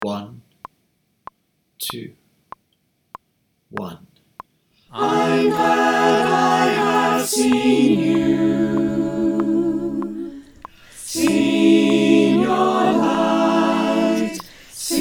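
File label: SATB, with click, choir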